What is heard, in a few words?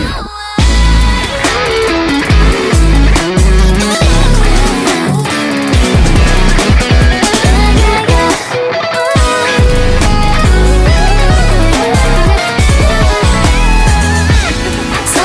first solo, guitar